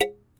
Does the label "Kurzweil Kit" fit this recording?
No